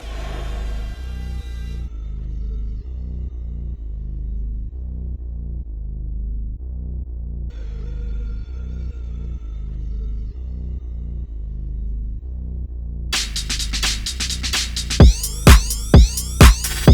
workout music, tabata songs, tabata workout 4 minutes workout song 128 bpm; gym